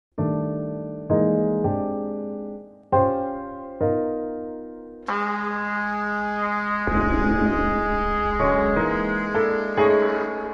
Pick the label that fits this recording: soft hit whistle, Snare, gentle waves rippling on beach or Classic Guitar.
Snare